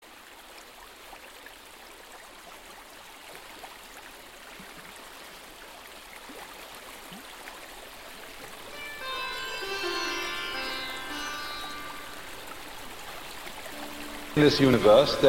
sitar & brook; Meditation; Inspiration

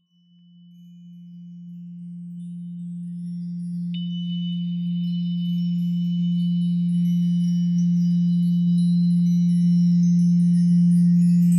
Tuning Fork Sound Healing Solfeggio Freq; Healing Solfeggio Frequencies; Meditation; guided; 20 Minute Meditations

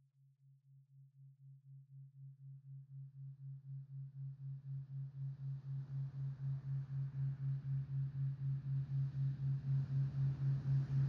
Surf (136hz), Meditation binaural; Pacifica; Binaural Hybrids

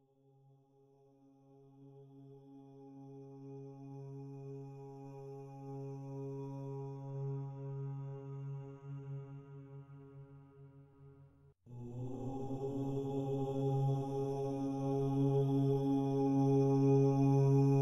Babbling Brook w Sitar Music (OM Lead); Meditation; guided; 20 Minute Meditations

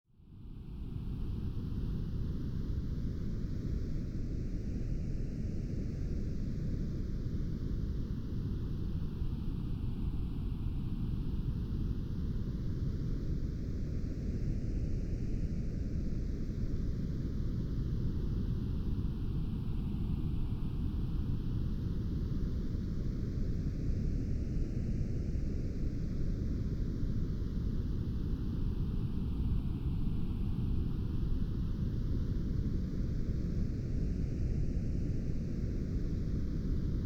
Hemi-Sync Meditation, binaural

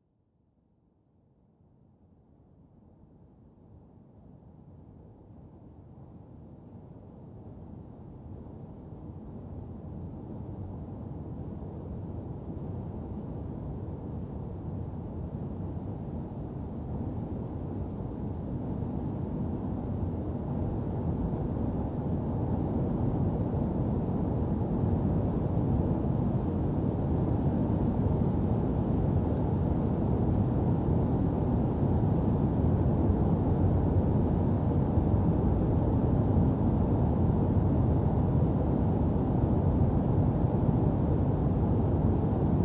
Remote Surf Sounds; 30min; Meditation; ambient

